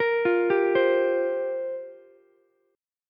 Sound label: F Suspended 2nd Suspended 4th down Ukulele, Fsus2sus4 chord; arpeggiated; JGuitar